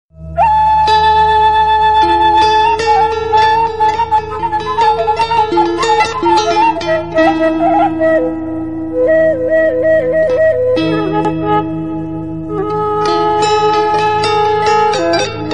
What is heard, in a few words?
Sunda Bamboo